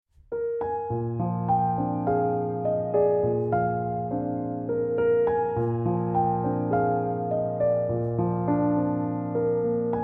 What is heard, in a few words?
piano instrumental